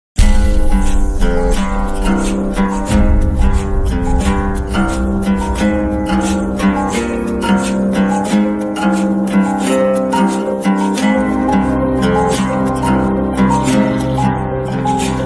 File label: bgm malayalam ringtone; 7th day; prithviraj